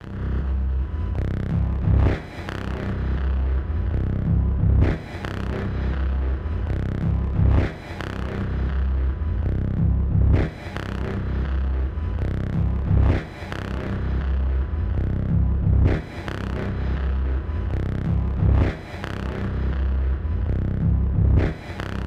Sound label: Chemical Clouds C 87bpm